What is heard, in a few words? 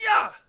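Swordsman Attack Vox; Civ4 beyond sword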